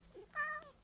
meow; Sex Traveler Comic Convention

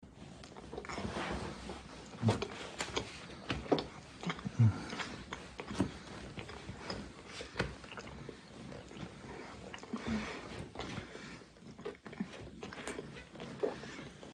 suck, moans; The Witcher Hunt

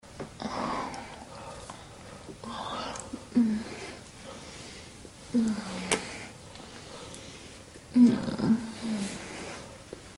indoor; Sexy Witch 2 The Bungler and the Witch

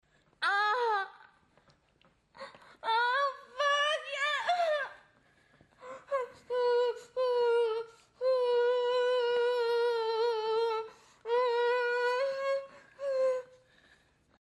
sex, moans; The Witcher Hunt

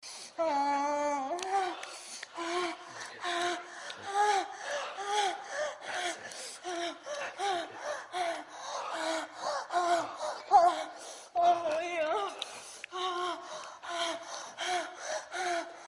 sex, moans; The Witcher Hunt

